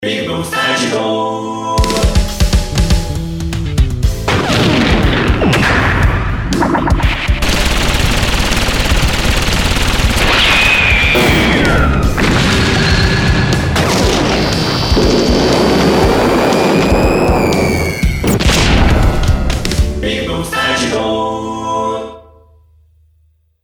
効果音 白いリアルロボット; ぴぐみょん効果音素材集003白いリアルロボットサンプル; ぴぐみょんスタジオ作品紹介(効果音素材集)

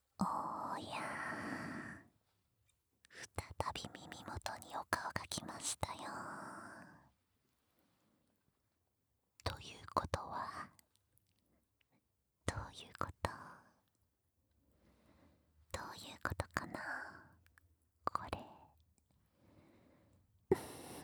癒しの耳舐め。ねっとりヌルクチュ外側舐め

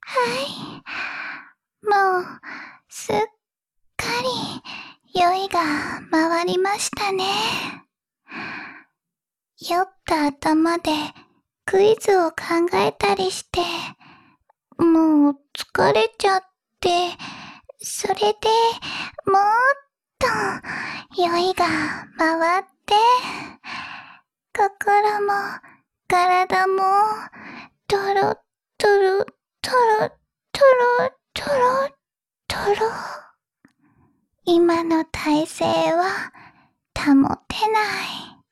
膝枕なでなでマッサージ＆染み込み耳かき, ASMR

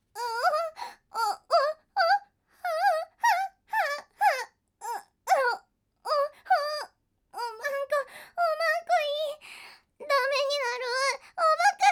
バイノーラル んおぉっ おっ おっ ほぉっ

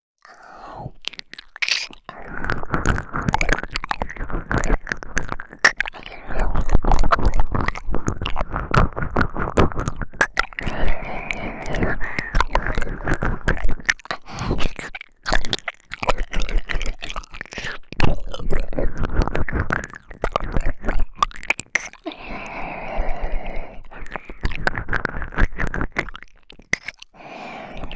ずーっと 耳舐め

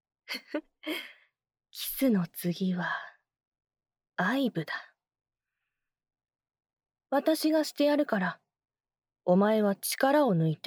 『大人の女性の責め、耳舐め（右耳）』, ASMR

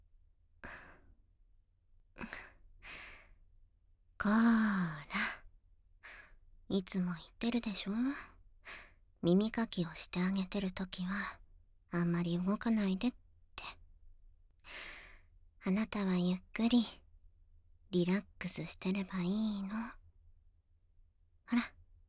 耳かき, ASMR; 異世界魔王といちゃらぶ耳かき

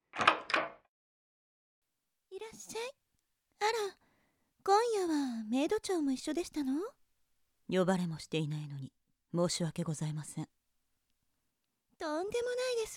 嘔吐実演＆生音 効果音入り; メイド長の秘密

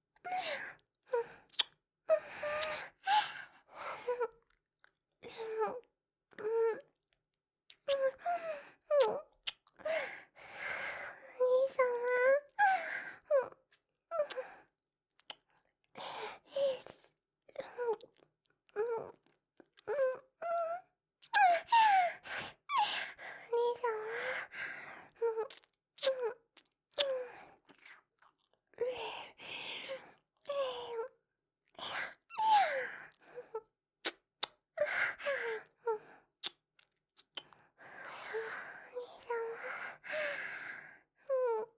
キス; リリア

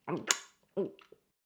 フェラ音声, ASMR; 少尉; 牧場戦腺

